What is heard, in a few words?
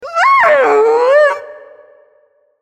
Howl; Tiberwolf